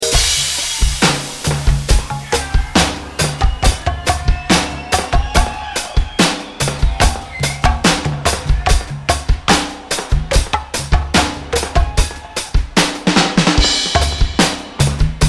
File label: Drum Dub